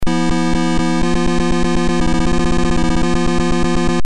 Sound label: warning